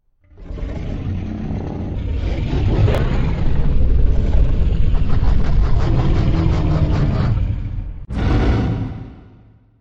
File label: growl; omegadominus